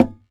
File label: SAZ TAP; Spectrasonics; Hans Zimmer Guitars VOL-2